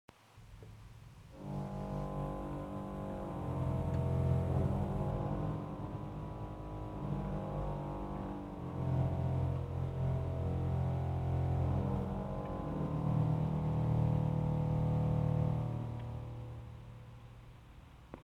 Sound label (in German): Oboen Reihe 16 8 4 Waldhorn 16, Orgel